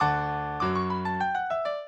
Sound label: minuet, mozart